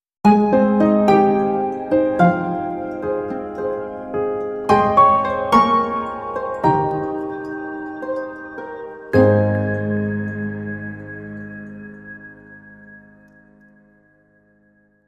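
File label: Sting No Percussion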